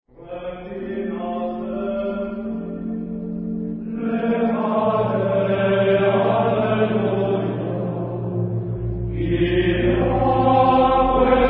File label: Regina caeli Gregorian Chants; 古典（格列高利）